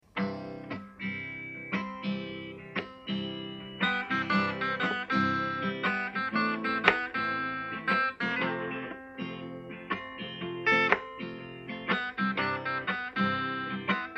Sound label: unplugged